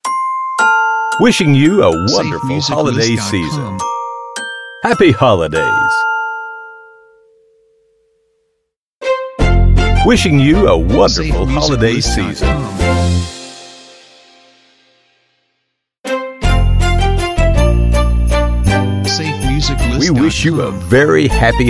Christmas Holiday Corporate Greetings; SafeMusicList

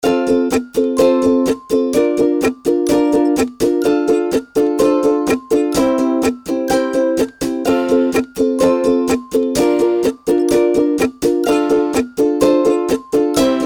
Friendly Fun Ukulele Loops; SafeMusicList

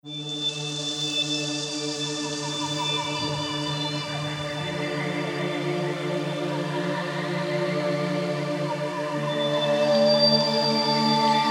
African Journey Ambiance; SafeMusicList

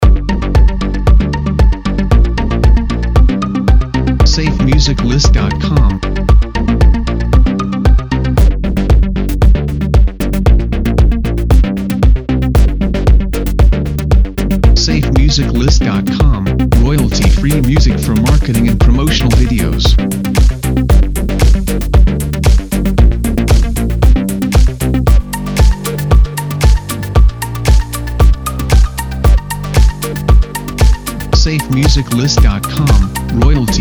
Trance

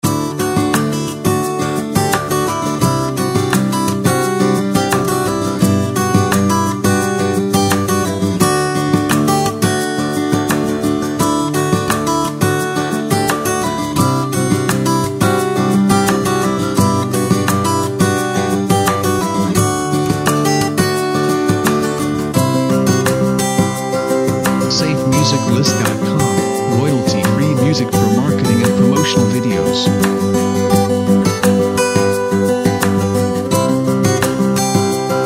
Fresh Air Acoustic Loop; SafeMusicList

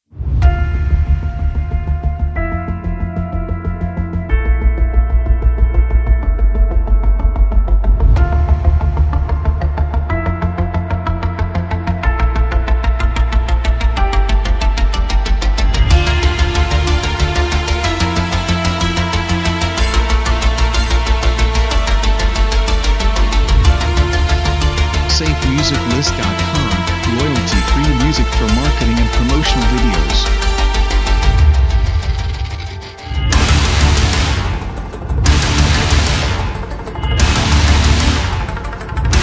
Impact

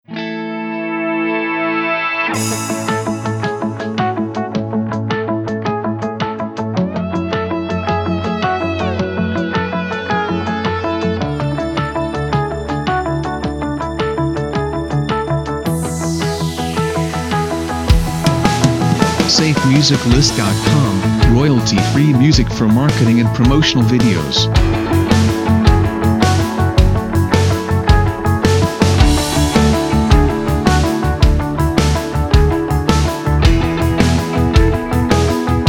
Winning Plan

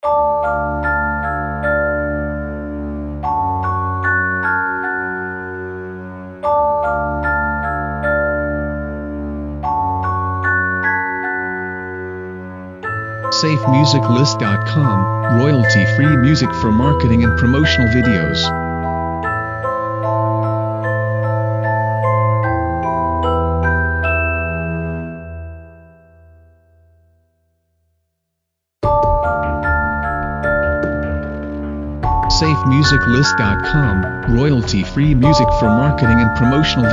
Spooky Halloween

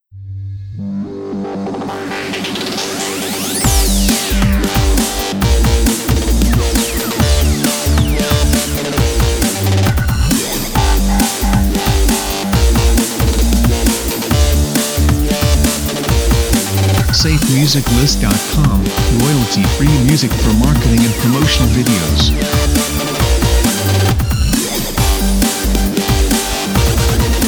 Power Breaks Intro; SafeMusicList

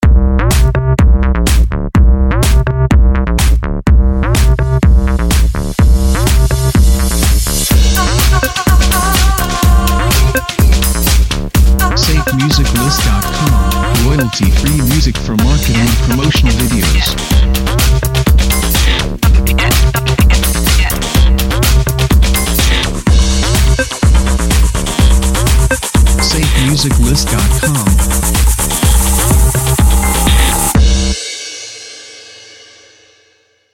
Upbeat Techno House Bumper

